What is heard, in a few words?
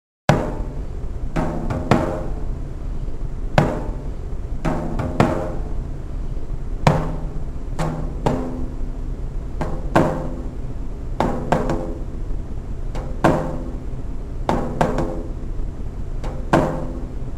bodhran